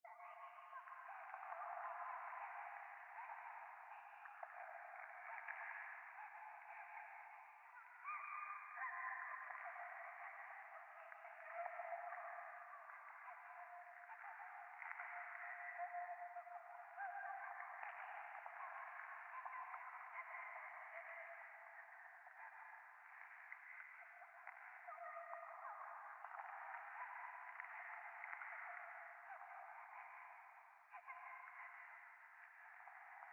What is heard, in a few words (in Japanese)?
ゴースト 可愛い喘ぎ 触手